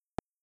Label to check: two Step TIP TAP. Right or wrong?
Right